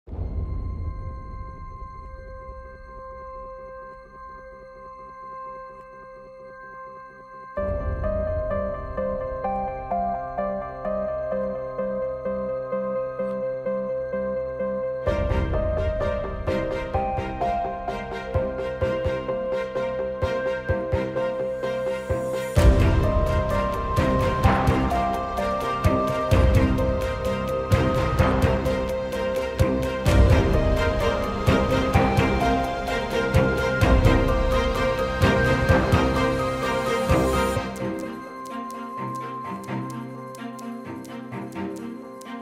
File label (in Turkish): Enstrümantal fon müzik; Kadın